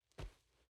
dragon footsteps

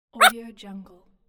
cartoon dog single bark; applehillstudios

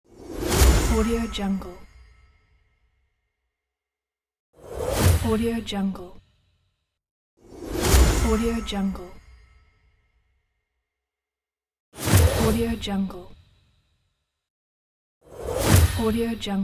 teleport

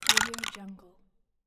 large plastic building brick toy pile drop

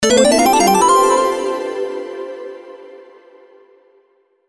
credits roll in

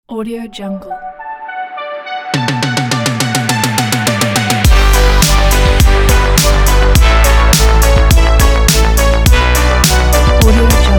in synthwave

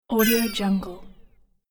game fall drop slide

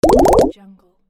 bubbles

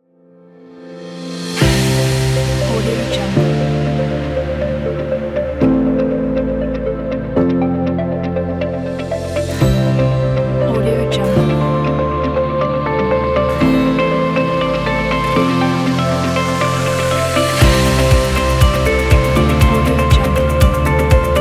motivational corporate

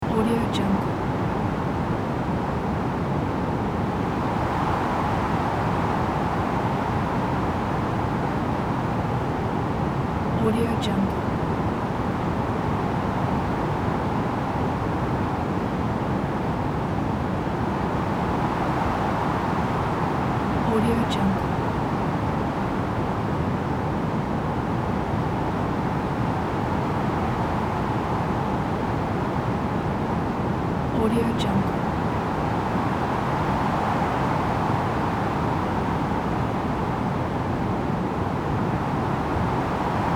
field wind medium loop

